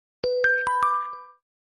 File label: xiaomi mi 11 ultra default